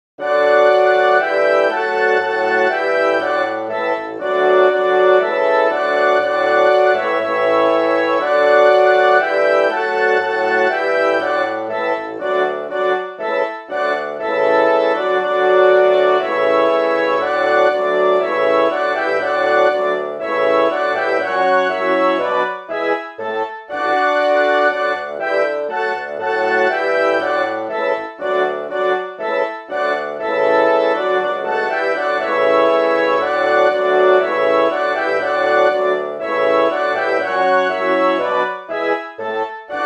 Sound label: National Anthem (Instrumental); Celestqua